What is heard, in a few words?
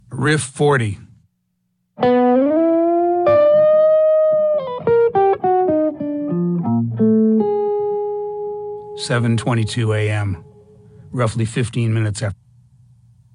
Riff